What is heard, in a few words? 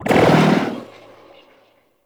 Puzzle Orb Spawn; Warframe; Duviri Murmur; Medusa Puzzle